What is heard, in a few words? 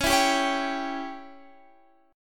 C sharp Diminished C sharp down Guitar Standard; C#dim strummed; JGuitar